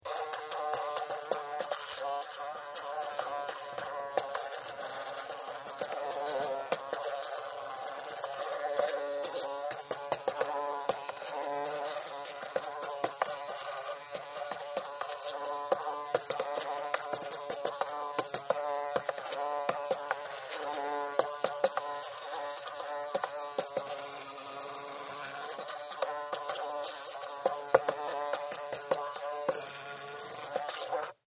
Fly, Trapped, Inside, Container, Buzz, Wings, Insect, Bug; Sound Effects:Animals:Insects; Flies; Blastwave